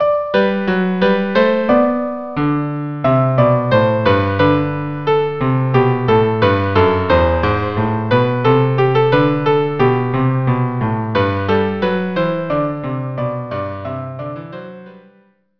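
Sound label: viola cello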